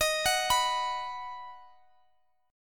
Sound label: D sharp Minor Sharp 5th D sharp, Guitar DADGAD; chordshape